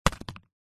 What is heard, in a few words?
papadum set down stack on another